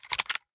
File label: pistol rattle; gmod; arccw uc commons attachments